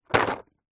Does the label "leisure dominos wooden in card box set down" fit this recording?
Yes